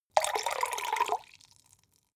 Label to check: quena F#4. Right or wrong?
Wrong